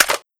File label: g36 clip out, weapons; garrysmod